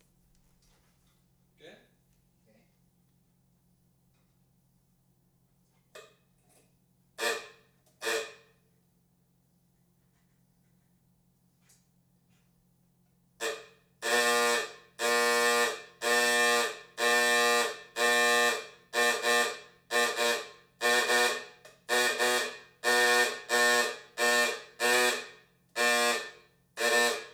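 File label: 1921 MODEL "T", ANTIQUE CAR HORNS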